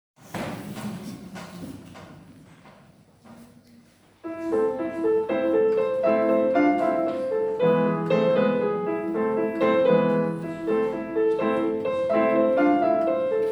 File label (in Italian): pianoforte; Cavaliere selvaggio di Robert Schumann